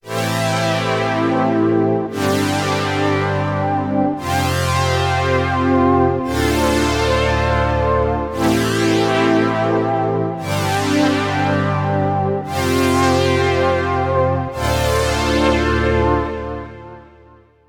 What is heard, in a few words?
Korg minilogue xd